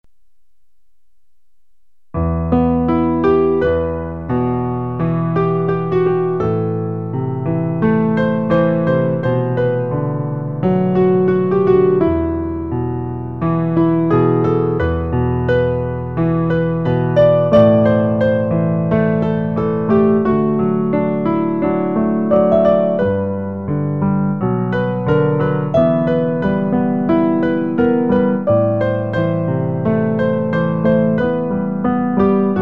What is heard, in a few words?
piano; Car Ta bonté; Pour accompagner le recueil Louez Dieu.